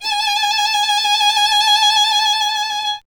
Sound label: STR VLN, Solo Instruments; Miroslav Vitous